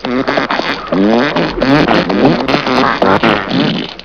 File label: lots of farts